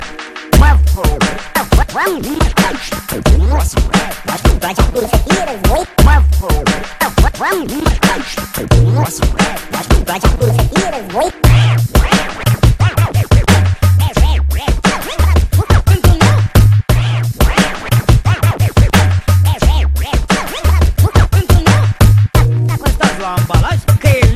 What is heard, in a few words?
skretch muzika